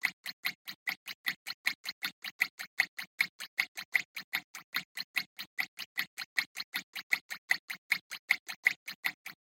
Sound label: Walking Teeth